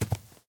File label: cloth, step